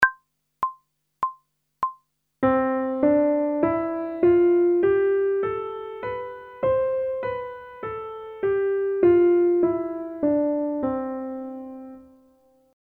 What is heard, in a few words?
Major Scale one octave ascending and descending, pop vocals; grade 3; Technical Work